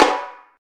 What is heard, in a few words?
Repinique Hard, Percussion; Roland BK-5